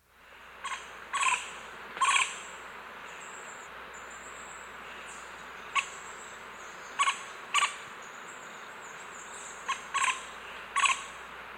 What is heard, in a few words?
Pale-billed Woodpecker, S. America